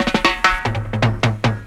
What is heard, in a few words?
On A Roll, Drum Rolls; Zero-G Jungle Warfare 1, 2 & 3; 90 s Sample CDs